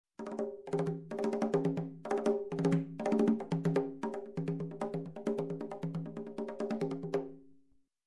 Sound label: Rhythmic Conga Groove